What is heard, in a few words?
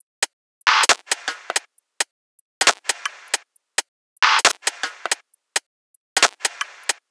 Bpm135 Top Loop; Dubstep